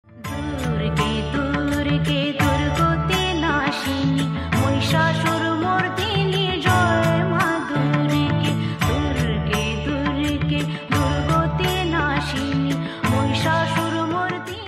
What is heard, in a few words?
devotional bengali song; jai maa durga; durgamata